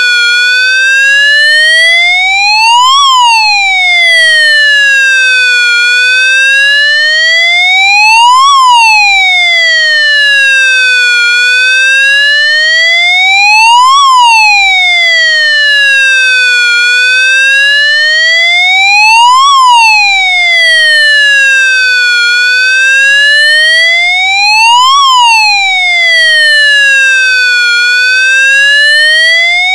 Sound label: special warning